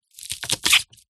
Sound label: leek slice knife on wooden chopping board; food